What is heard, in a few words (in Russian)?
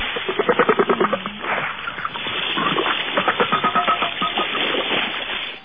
serij kit viplil na poverhnost